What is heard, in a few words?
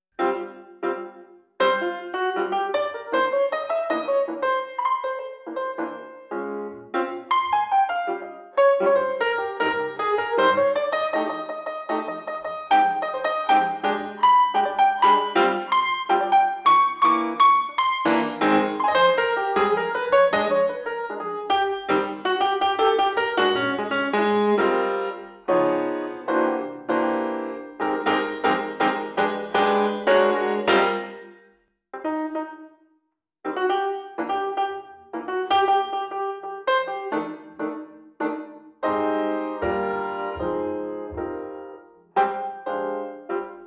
Cocktail Piano Lively 157